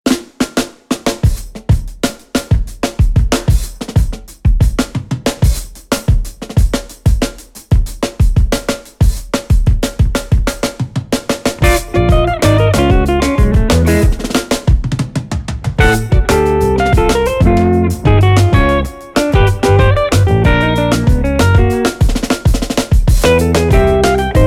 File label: Major Chord